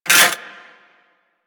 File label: Mech, Weapons, Rocket Crossbow; Warframe; Archwing; Arch Prisma Fluctus